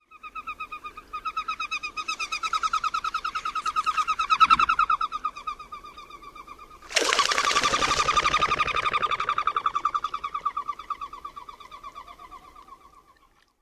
flight whistles

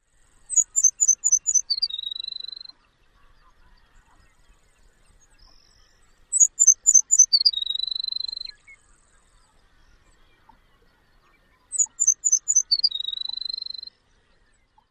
songs

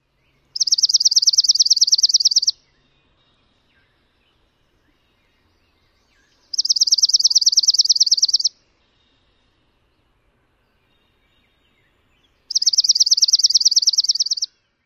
fast pulse-rate song

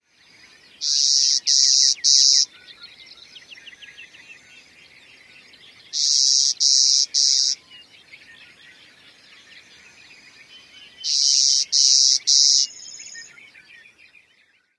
3-buzz songs

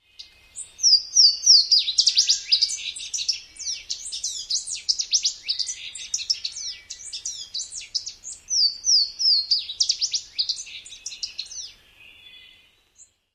extended song

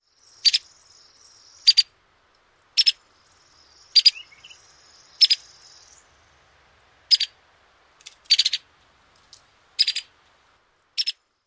calls

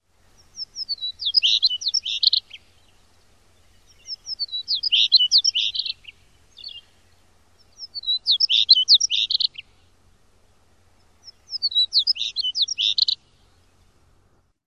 songs; WY

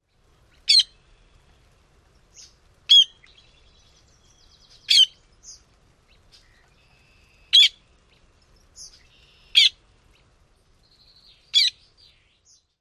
one-parted squeaks immature

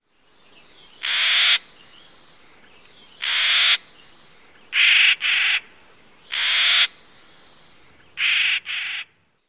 calls

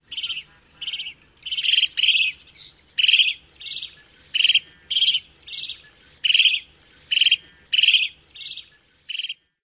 juvenile trills small flock

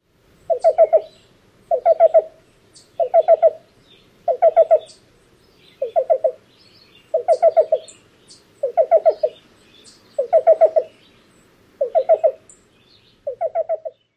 song

